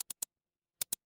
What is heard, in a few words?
office mouse click